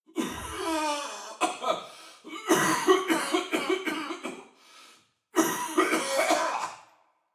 Infirmary SFX

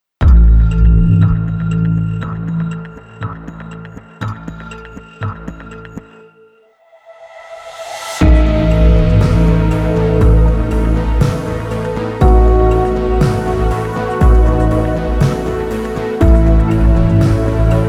Music Ending

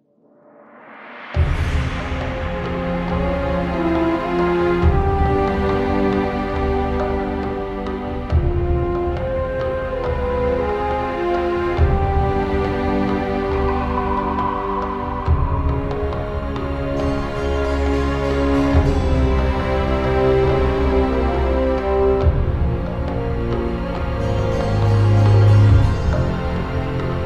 Jingle Arab; rise of venice windows gamerip 2013

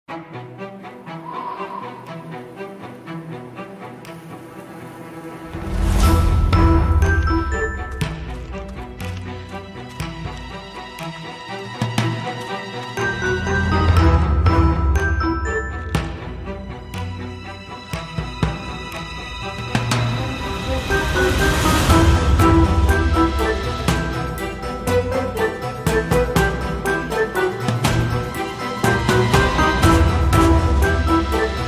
Login Music; Town of Salem 2

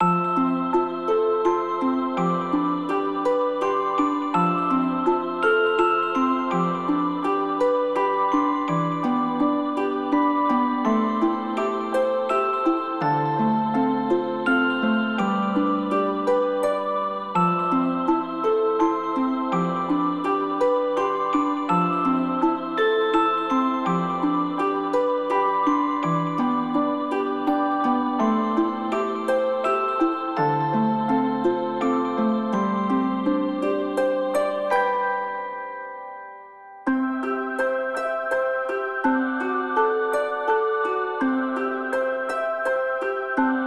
BGM EVE HAPPY END; Girls RPG Cinderellife; 3DS

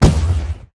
Attack; I Am Weapon Revival; Valkiry

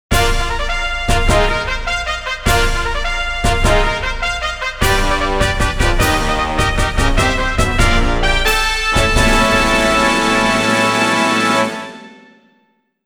Chukyo General Race Fanfare; Kokura

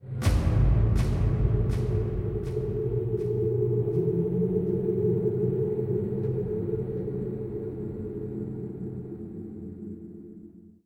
Music Shorts

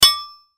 Metal; Secrets of Magic: The Book of Spells

